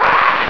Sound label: YOU DIE